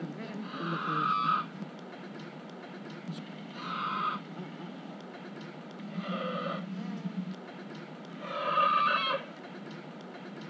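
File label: swan goose voice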